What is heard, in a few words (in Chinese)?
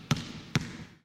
拍球; 战中; nba